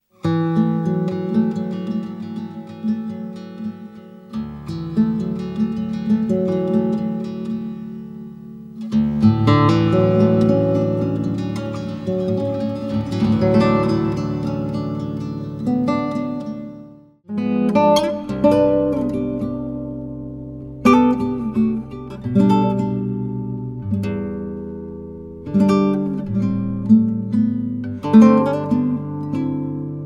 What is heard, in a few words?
Acoustic